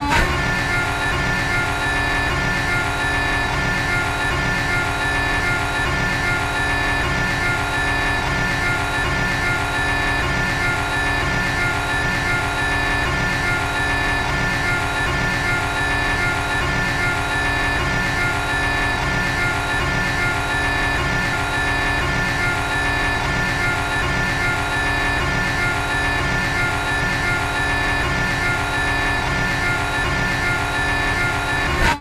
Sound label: turret, armored vehicles